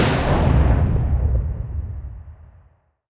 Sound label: type3 last shot, guns; War Thunder